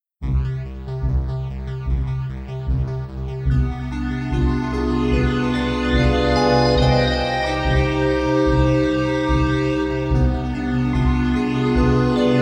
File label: Intro Music